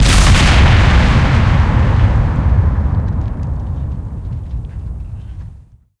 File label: torpedo explosion; War Thunder